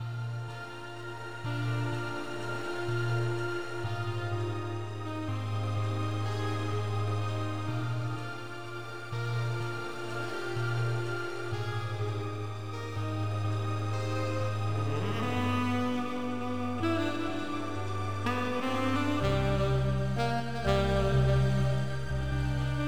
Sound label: sax solo